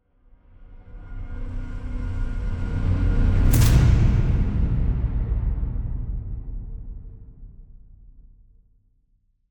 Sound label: automated panning